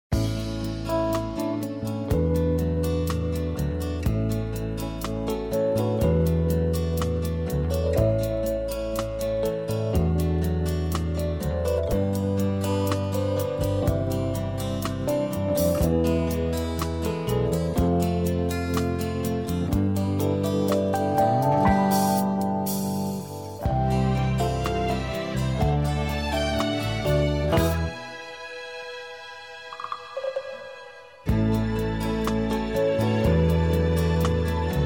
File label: Easy Listening & Classical; Sound Ideas